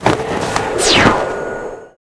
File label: Harpy Skill; Claous; Gamigo